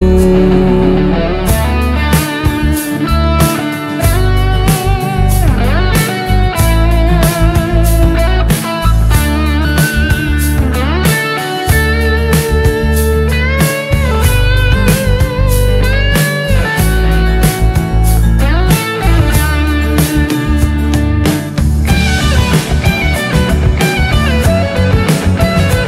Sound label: Instrumental Tone